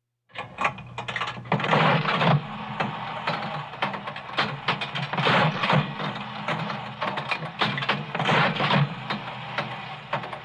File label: Slot Machine, Coin Drops